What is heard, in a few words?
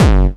Kick, Ghetto Electro Kicks